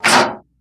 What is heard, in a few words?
Switch Floodlight; electronics